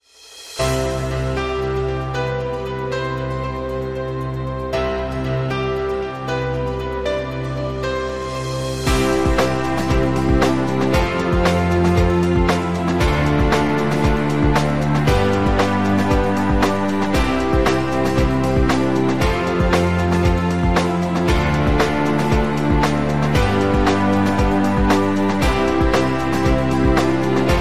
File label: corporate vision